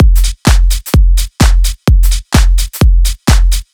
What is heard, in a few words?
Fat Tech Beat 128 bpm, drums; Loops; Ableton Live 12 Lite; Core Library